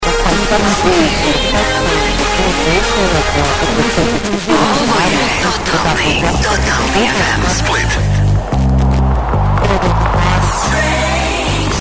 croatia, stations

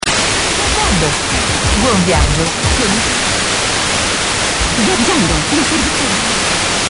ping; perseids

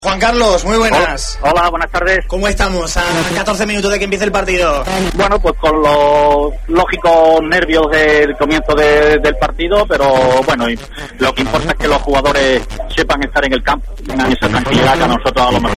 spain, stations

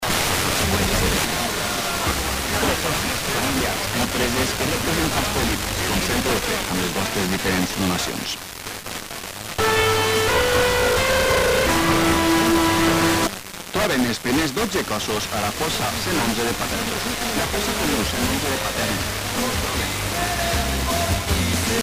spain, stations

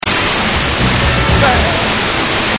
ping; perseids

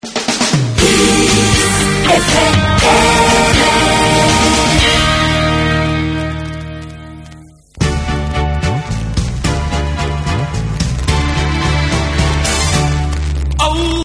jingle; spain

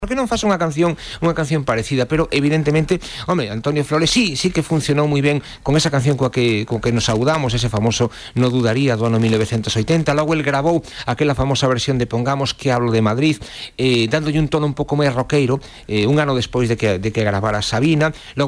spain, stations

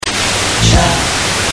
fast ping

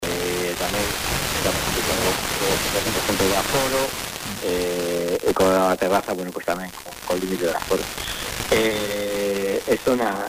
spain, stations

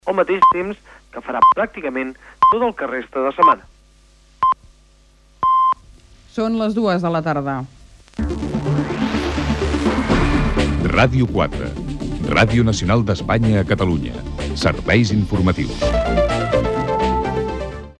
4 pips; rne

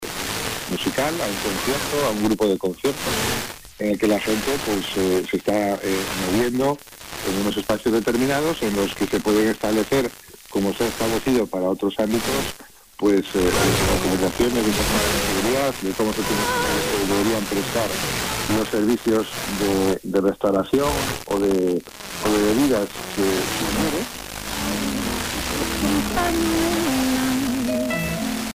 spain, stations

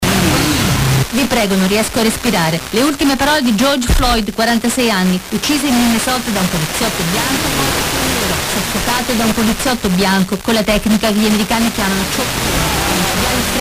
italy, stations

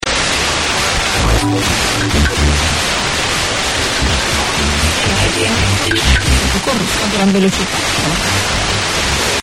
ping

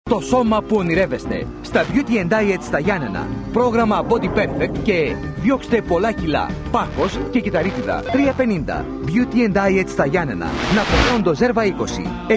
greek, stations

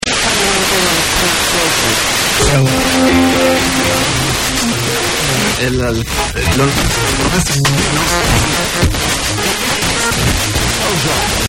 ping

